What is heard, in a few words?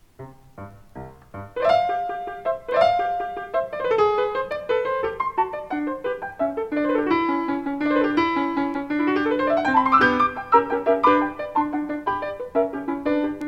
Allegro vivace